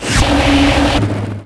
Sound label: turbo; ships; Quantum Redshift; XBOX; leah